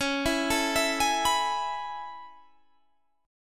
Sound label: A Major 9th C sharp, chord